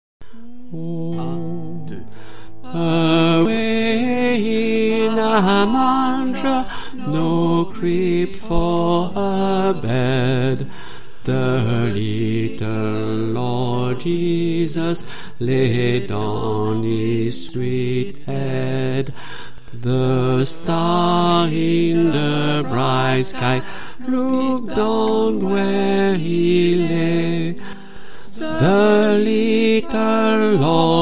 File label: Away in a manger BASSE, chorale